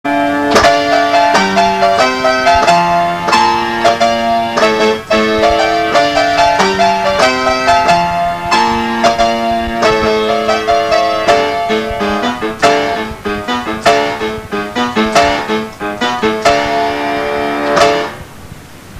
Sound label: Baroque